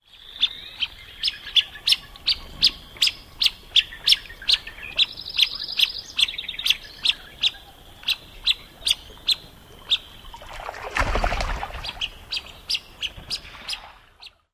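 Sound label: Black-winged Teal male peeps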